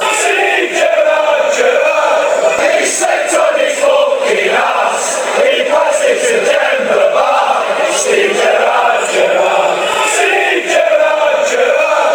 chants; steve gerrard gerrard

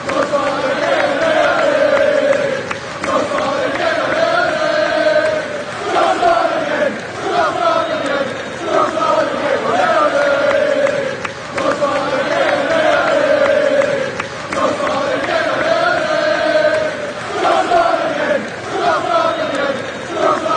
player chant, fan chants; trossard; arsenal; trossard again ole o

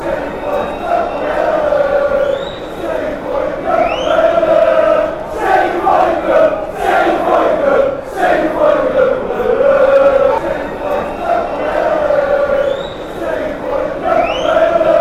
den haag chant

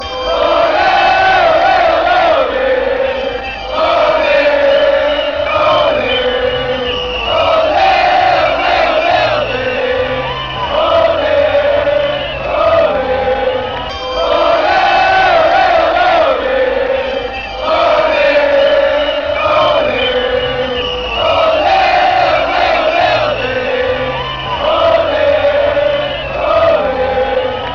ole italia, fanchants

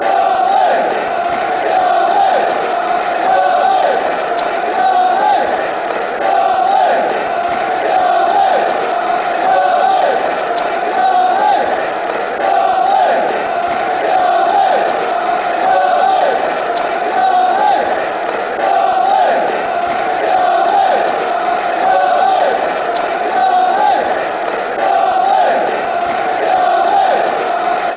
yellow army

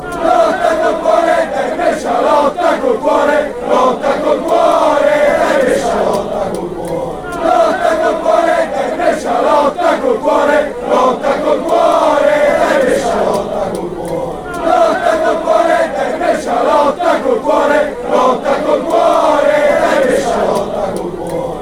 with heart, fan chants

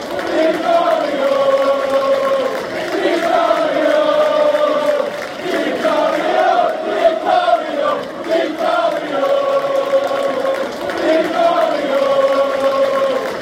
player chant; vicario; tottenham

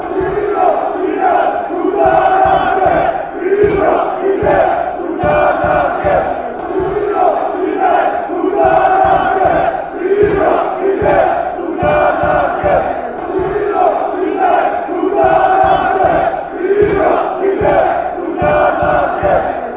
chant, fanchants; olympiakos

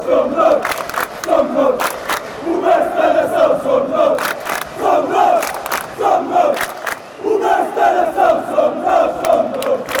nations cradle, chants

